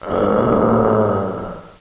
Mummy Growl; Playable Tutorial Level; TR1

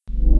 Energy Beam; Playable Tutorial Level

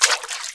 Footsteps Wet; Lara; Playable Tutorial Level

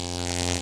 Electric Arcing Loop; Playable Tutorial Level

